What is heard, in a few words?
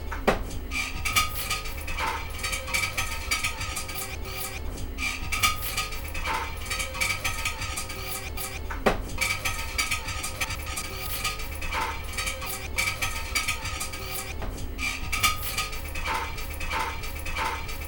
cut and chunk clink